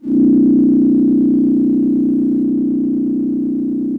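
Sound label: DX100 D#1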